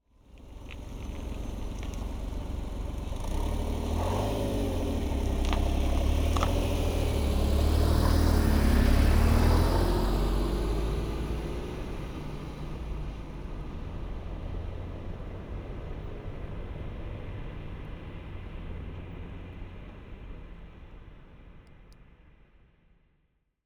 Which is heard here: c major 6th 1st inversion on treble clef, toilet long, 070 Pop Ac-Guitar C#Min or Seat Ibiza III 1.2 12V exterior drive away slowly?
Seat Ibiza III 1.2 12V exterior drive away slowly